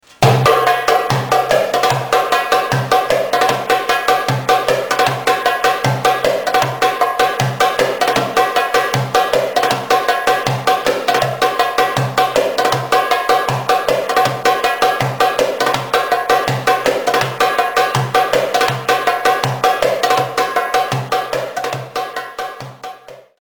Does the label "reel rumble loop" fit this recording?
No